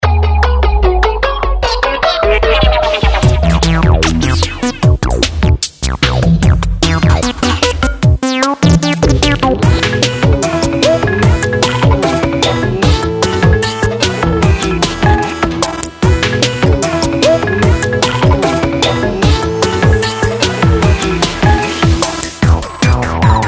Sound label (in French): GENERIC KARAOKE ENFANTS